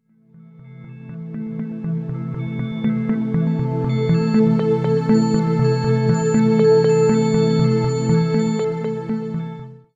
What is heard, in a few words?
ERH STRINGS; sloodle